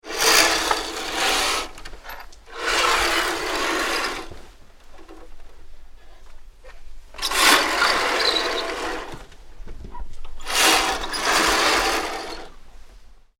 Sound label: Curtain Running on Metal Track; Various curtain pulls on metal runner; Miscellaneous Household; Tom Hutchings